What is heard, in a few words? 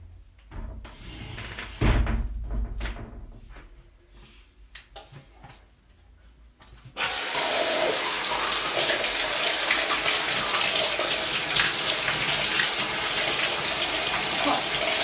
Cold Shower, Moans, Water, Bottle Sounds, No Talking; ASMR; No SFX; F4A; Erotic audio